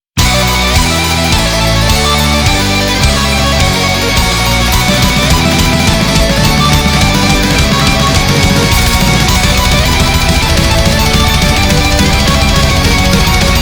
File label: BPM210, Instrumental; 上海アリス